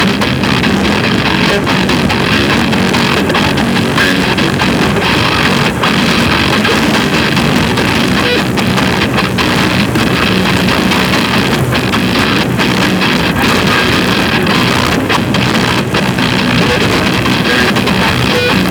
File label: Fire Shot Loop; weapons; Lizzie; Warframe